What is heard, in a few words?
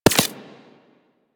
Laser Impact Generic; Surface